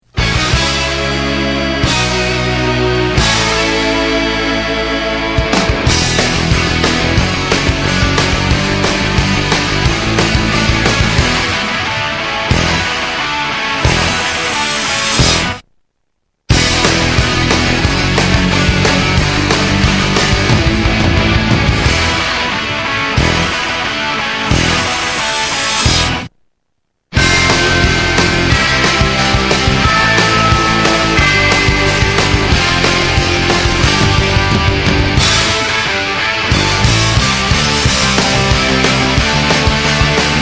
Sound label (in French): musique generique instru